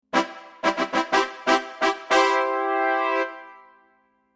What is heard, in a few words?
Jubilant Fanfare, Triumphant brass fanfare; Musical Sound Design